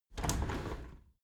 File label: window handle squeak turn open halfway regular; objekte; fenster